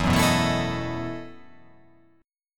E Major 7th Suspended 2nd Suspended 4th

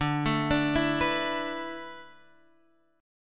D 6th Suspended 2nd

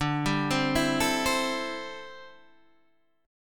D 9th Suspended 4th D down Guitar Open D

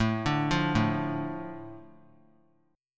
A Suspended 4th Sharp 5th; Asus4#5 arpeggiated; JGuitar

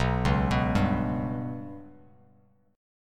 B Suspended 2nd Sharp 5th down Bass Standard; Bsus2#5 arpeggiated; JGuitar

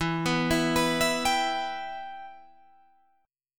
E Minor E down Guitar Drop D

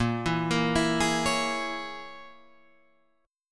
A sharp Minor 7th Flat 5th A sharp

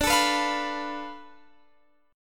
D Minor 6th Guitar Open F; Dm6 strummed; x,21,21,18,20,18

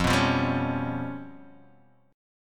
G 7th Flat 5th

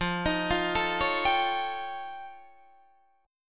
F Augmented 9th F Guitar Open D, chord

